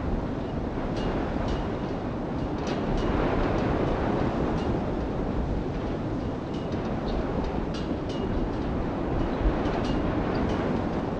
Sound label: wind city, ambient